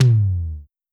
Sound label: Tom Low; One Shots; Drums